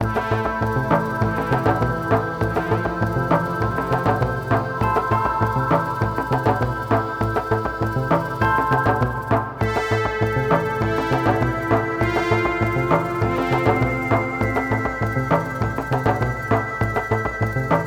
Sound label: Acolyte Stinger